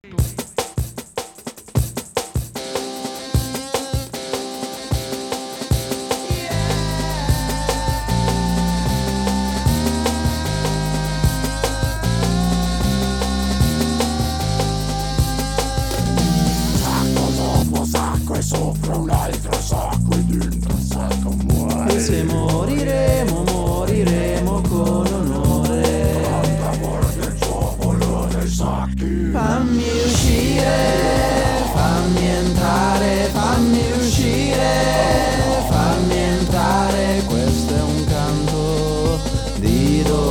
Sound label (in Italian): canto